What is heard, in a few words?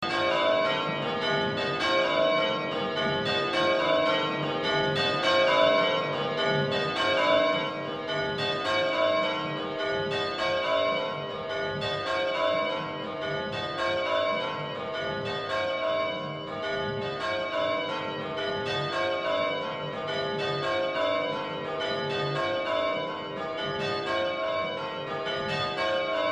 St Andrews bells recorded from inside the tower, Anglican church